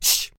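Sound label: female shushing sound calming a baby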